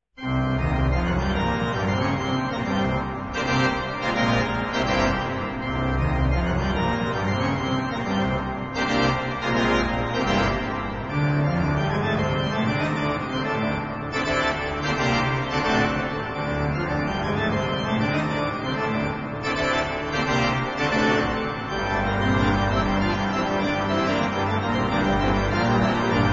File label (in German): Postludium